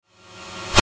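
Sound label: Musical Element, Drum Snare March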